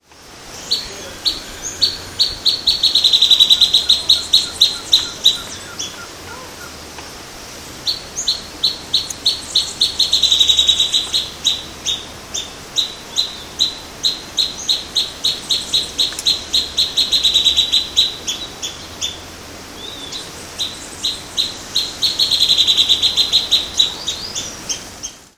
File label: Xiphorhynchus fuscus; Pco Anta Mongagua SP